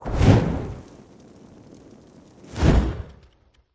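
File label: fire draw sheathe loop; skyrim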